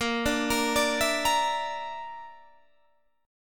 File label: A sharp Major Flat 5th, Guitar Standard; arpeggiated